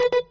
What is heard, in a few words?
countdown